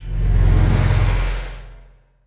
rebirth

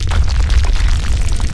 mob raid run; Monster; 레이드; 후토신마

